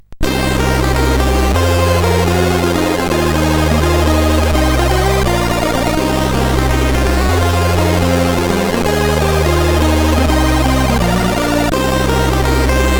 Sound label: F Sharp, sid CSG8580R5; Jakim